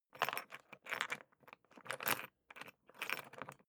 briefcase plastic latch lock key turn ringing; industrie wirtschaft; bueroarbeit

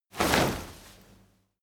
crowd movement single hard; bewegung; soundpool

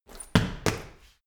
medicine ball wall throw, fitnessstudio

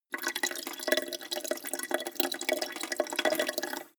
sink drain; haus garten; badezimmer

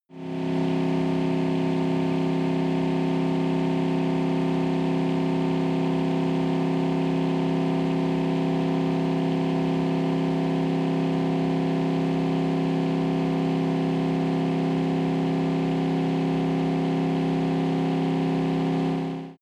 car camaro very high rpm exhaust

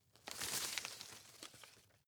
rustle soft; berufe

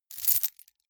key ring large rattle air; objekte; schluessel

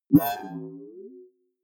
query smooth high, signale

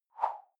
whoosh simple slash big high; swooshes-swishes

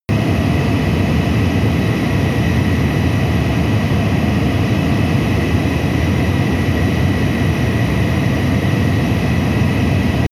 military jet bomber turbine steady; Airplanes - Jets

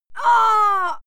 female fight scream pain

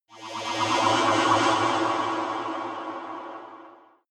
space alien spacecraft fly; raumschiff

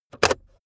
car backseat table pull in cupholder fast

